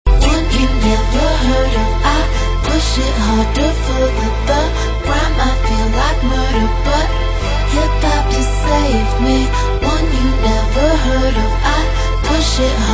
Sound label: hiphop